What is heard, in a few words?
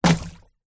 tub plastic large empty throw onto deep water; zapsplat